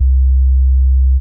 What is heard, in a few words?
C Bass, DnB One Shots; Electronisounds; DnB Rebellion